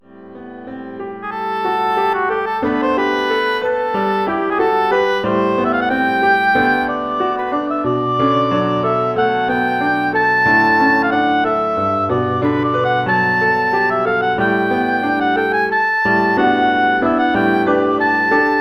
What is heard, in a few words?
oboe solo PA